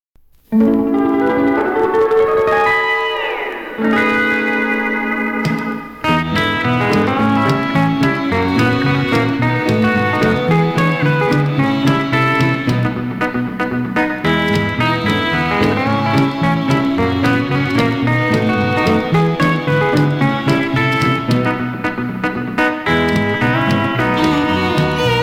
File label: Wrong Guitar